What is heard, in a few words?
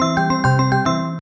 win; hotfruits27